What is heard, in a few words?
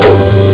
Beat; Kids Can Save the Earth